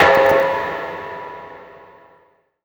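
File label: percussion FX, Dance Drums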